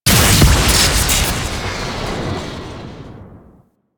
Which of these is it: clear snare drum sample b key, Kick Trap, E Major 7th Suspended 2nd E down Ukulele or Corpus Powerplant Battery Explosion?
Corpus Powerplant Battery Explosion